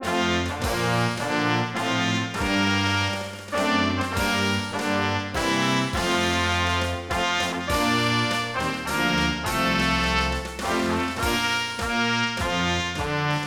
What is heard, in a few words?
US National Anthem; Spades